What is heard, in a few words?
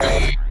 Menu Scifi Error; Menu FX